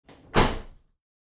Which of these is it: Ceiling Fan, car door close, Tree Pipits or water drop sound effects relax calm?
car door close